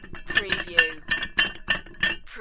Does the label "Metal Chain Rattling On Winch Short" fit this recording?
Yes